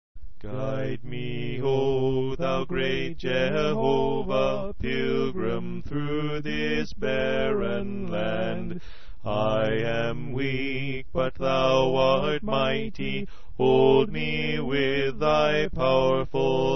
Guide Me O Thou Great Jehovah Alto

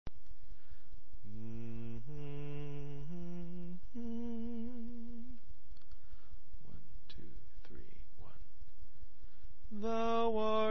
Soprano

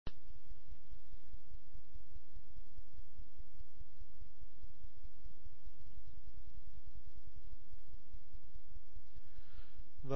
Alto

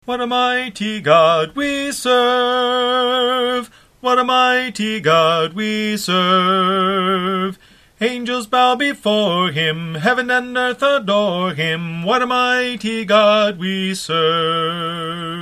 soprano

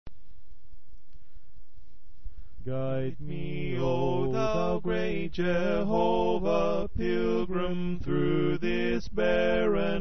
Guide Me O Thou Great Jehovah Tenor

